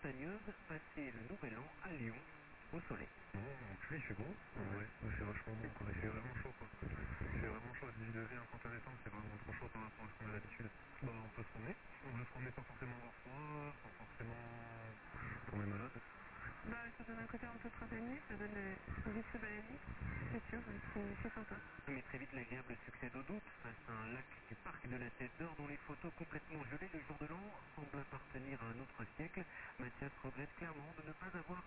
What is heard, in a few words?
websdr 162.0kHz; Nachdem RTL auf 234 kHz zum Jahresende 2022 abschaltet